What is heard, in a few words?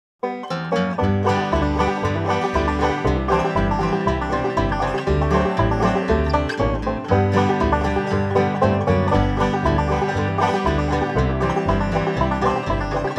Mountain Hoedown, banjo